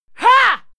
female fight attack hit

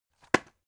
drug package drop deep hard; berufe; arzt

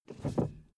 suv 1st gear release fast; verkehr transport; autos

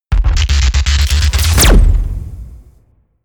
promo pad; music elements

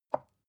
glass pick up clatter; objekte

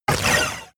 sci-fi laser shot short; krieg waffen

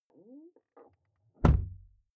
car door close interior hummer h3; Cars

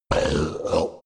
monster hit gurgle low; comedy-cartoon; soundpool

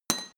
small drop indoors; handwerker; berufe

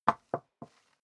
step leather shoes wood parquet scuff; schritte

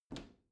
bathroom cabinet open soft; objekte; tueren

